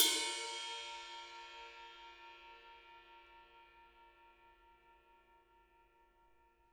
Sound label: RIDE, RIDE CYMBALS; Spectrasonics - Backbeat